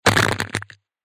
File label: Crunchy Hit Impact; Miscellaneous Impact Sound Effects